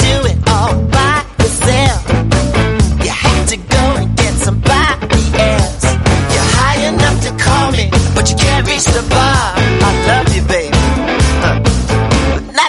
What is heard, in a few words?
guitar